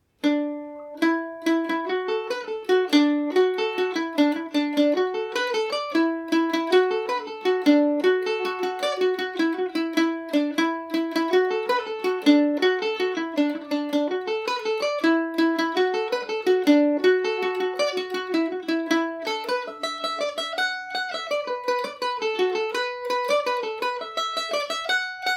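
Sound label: E dorian, Irish mandolin; tom billys revisited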